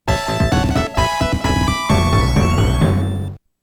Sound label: 1-Up; Sonic the Hedgehog 3 & Knuckles